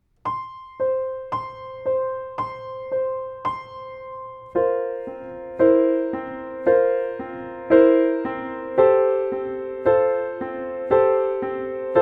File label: Rainsong piano